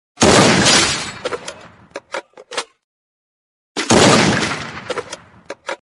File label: Message Ringtone; Free Fire